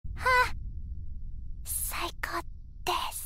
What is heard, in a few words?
explosion